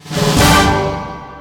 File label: old kill bonus